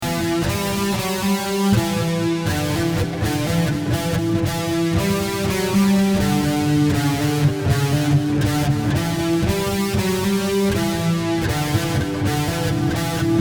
Black Hole Synth

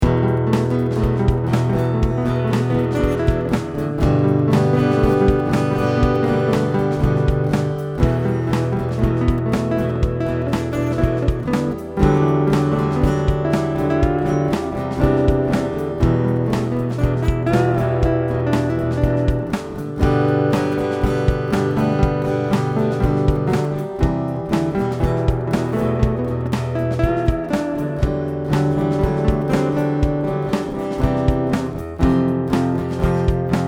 Acoustic

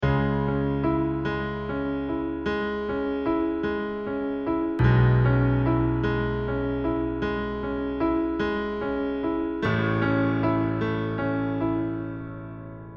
Piano

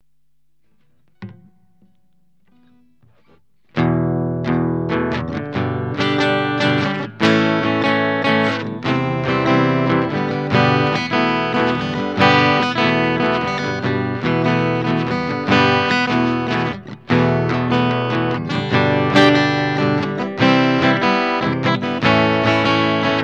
Chords

